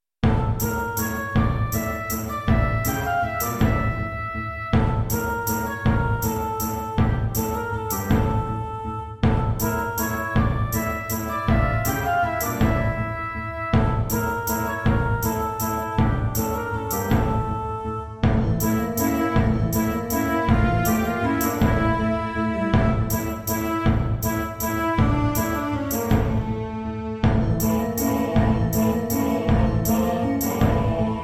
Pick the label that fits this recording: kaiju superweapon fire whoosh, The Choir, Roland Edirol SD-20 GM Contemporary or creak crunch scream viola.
Roland Edirol SD-20 GM Contemporary